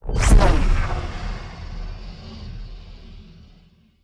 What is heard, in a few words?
action activation phase fleet; astral planes